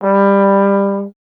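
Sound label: Brass Trombone G; Miroslav Vitous - Solo Instruments VOL-1; 90 s Sample CDs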